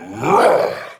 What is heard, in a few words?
Punch, Barks; Kuva Lich; Persona A; Ability